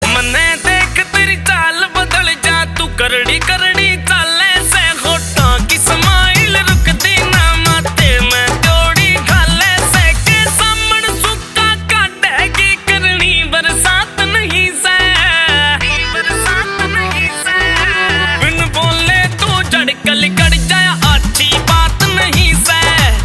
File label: Ringtone